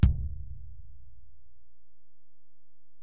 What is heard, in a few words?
Ac BD, Alesis DM-PRO; 300 Drum Machines